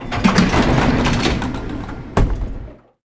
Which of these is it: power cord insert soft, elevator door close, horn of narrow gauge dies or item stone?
elevator door close